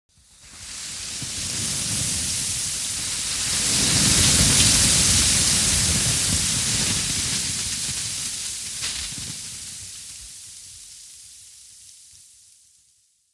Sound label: Avalanche